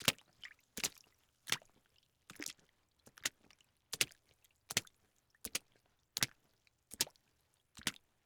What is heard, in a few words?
Footsteps Water; HauntedBloodlines